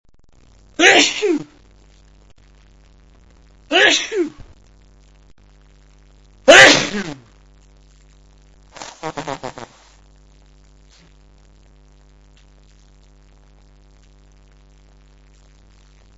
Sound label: cold, male